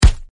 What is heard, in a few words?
hit; kudabot